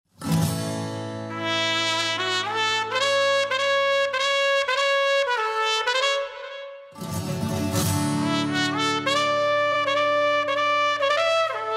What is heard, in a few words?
Karaoke Song